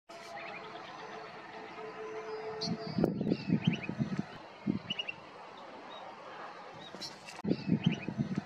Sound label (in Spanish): Batitues; Batitú (Bartramia longicauda); eran varias bandadas de 10 ó 12; Puse ambos sexos porque eran varias bandadas de 10 ó 12